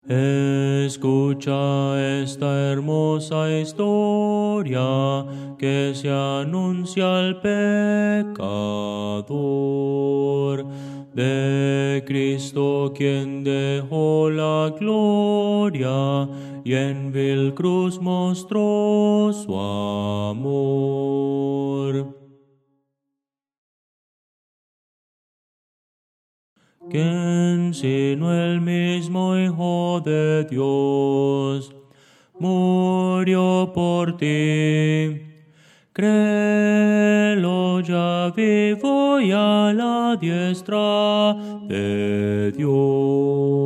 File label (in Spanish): bajo, himnos cristianos